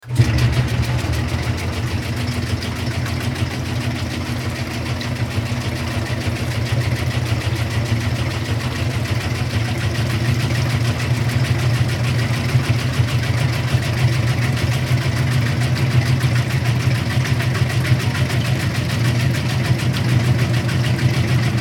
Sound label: Washing Machine